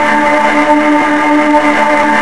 engine fast; ships; Quantum Redshift

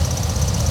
driving MAD; FRANK; Cars; XBOX

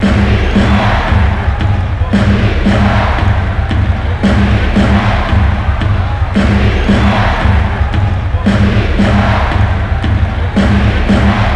chant; NBA Inside Drive 2004

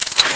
Cosh Close, Electric Cosh

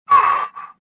pain; Star Wars Jedi Knight II Jedi Outcast; XBOX; shadow

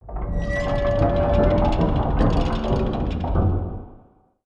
Wheel Move; MALL

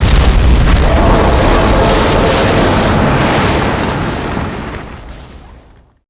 truck fall crash; Max Payne; tenements

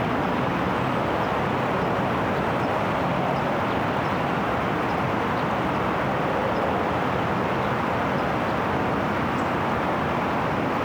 water river; ambience; Project Gotham Racing 2

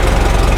Model T rpm, Engine; Cars; XBOX

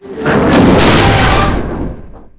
tower crash; Chase Hollywood Stunt Driver; XBOX

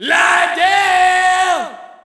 Shout, audience; UFC Tapout 2

